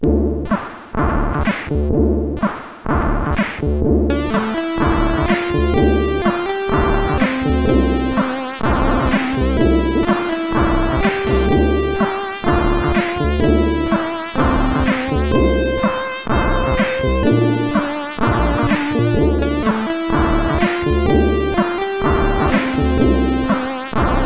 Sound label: sid CSG8580; Walking Trouble; hvsc